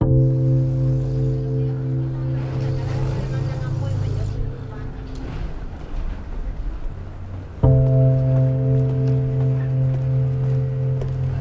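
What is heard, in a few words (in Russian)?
Zvuki goroda ASMR, city sounds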